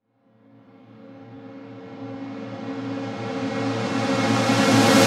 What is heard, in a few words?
Reverse Synths A#min; Vengeance - Essential Clubsounds Vol.4